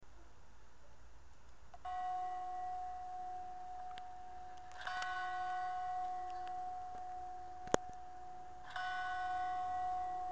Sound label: taipei city ambient